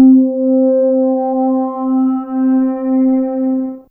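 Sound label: M D Weeeeee C2, Fairlight CMI IIe Analog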